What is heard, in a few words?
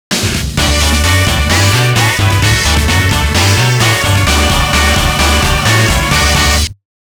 Groove Rush